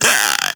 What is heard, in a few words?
Inf Splitter Split Top Vocal Pain, Infested; Warframe; Grey Strain